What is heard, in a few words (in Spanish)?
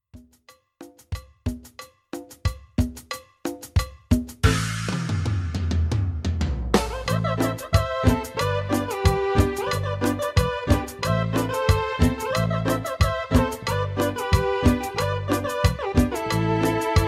Gran Cumbia; ritmos